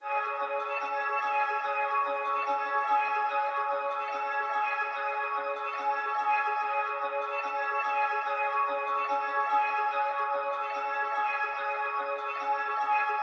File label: 145 BPM C# Min Pitched Ambience, melody loop stem; Trap; Loop Stems; Cymatics; 2022 Melody Collection; Ferrari